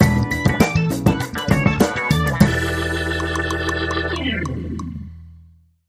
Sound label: Organs, Clean Sting